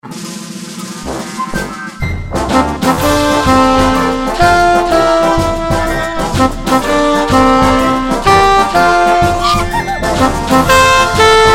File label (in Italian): Tanti auguri Melodia